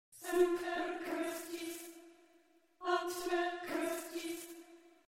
7-8 sop

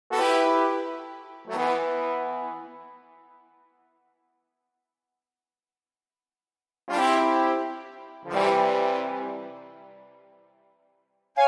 wood brass

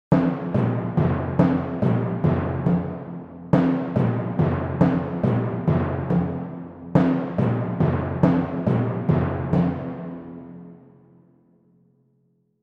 Timp

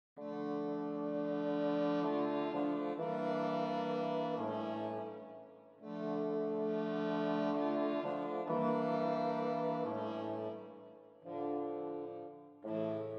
basset

